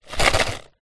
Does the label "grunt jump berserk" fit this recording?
No